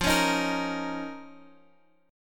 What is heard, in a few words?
F 7th Suspended 4th Sharp 5th